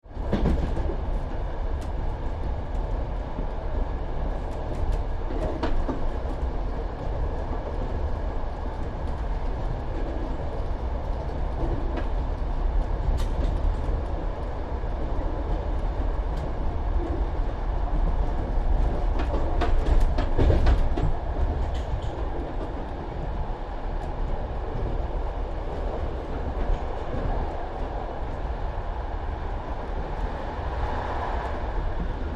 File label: Train Electric Interior Travel into Main Line Station and Stop; Train Sound Effects